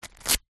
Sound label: sport goalkeeper glove velcro strap pull